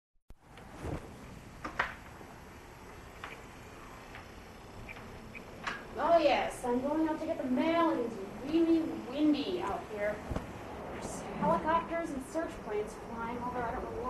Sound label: Wind And Rain